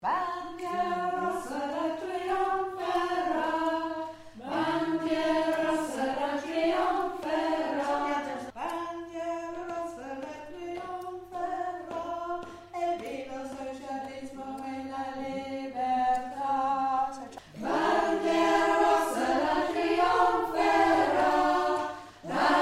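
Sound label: Chorus Alto; bandierra rossa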